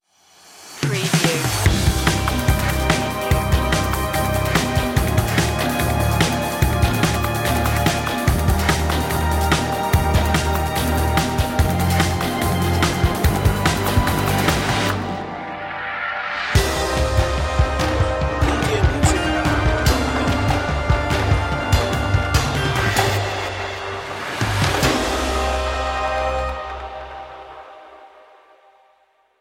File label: dark hero action